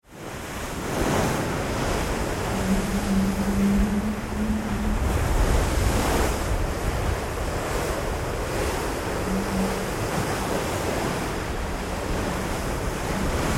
Ship Oil Pipeline Service Vessel Sea and Wind through Rigging; Tom Hutchings